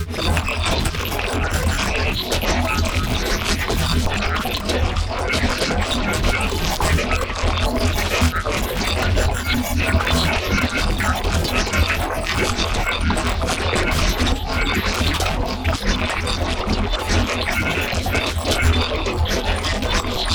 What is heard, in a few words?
Bass Granulize E